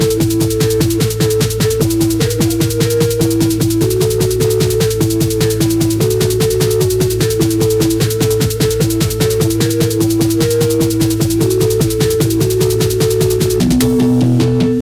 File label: kazakh brainrot music